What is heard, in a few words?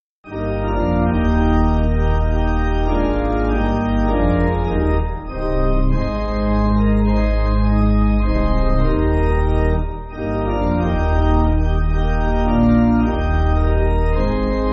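Pipe Organ, church backing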